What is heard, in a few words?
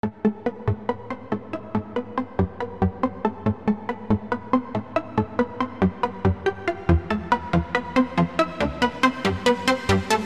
Hardstyle